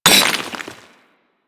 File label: Melee Impact Cement; Warframe; Weapons